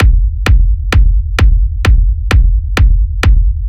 Dark Techno Kick Loop

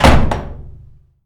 Door Slam

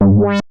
909 Tom

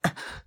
Breath Taken Aback

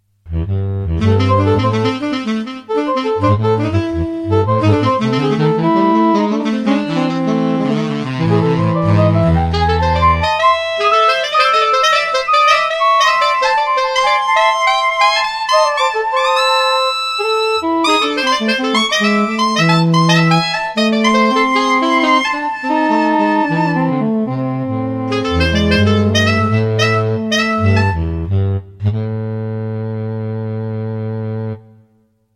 Saxophone Trio; Amsterdam

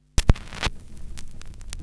Vinyl Cut

